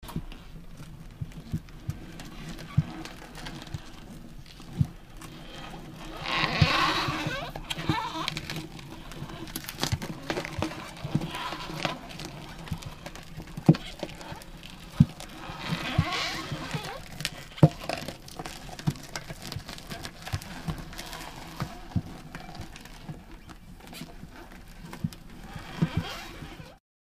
Car Tire Flat; Push Away Slow With Big Squeaks, No Motor